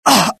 death, pain; mohaa; dialogue

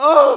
hit, pain; mohaa

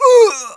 hit, pain; mohaa

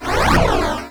sound spawn; singe; spacerocks

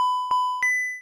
1up; singe; spacerocks

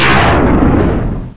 golem shot; hurrican

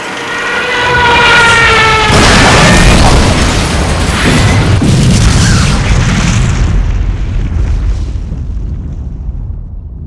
stuka crash street, vehicle; mohaa

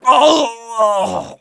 death; mohaa; dialogue

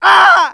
death; mohaa; dialogue

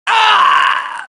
death, dialogue, Allies; mohaa; Mission 2

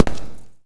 player tile; xash3d fwgs

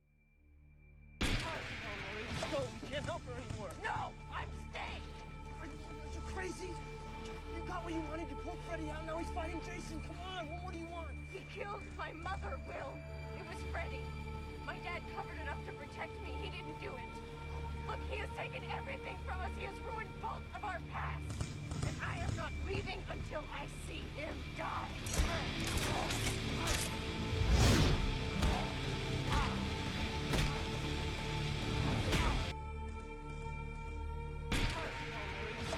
music; singe; Freddy.daphne

